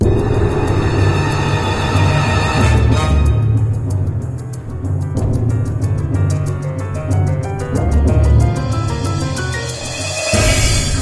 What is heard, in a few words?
Creepy B Loop